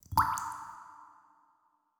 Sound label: Water Drop